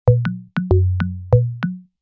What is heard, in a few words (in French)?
flute a bec